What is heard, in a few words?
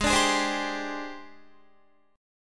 Ab Major 7th Flat 5th Ab, down, Guitar; AbM7b5 strummed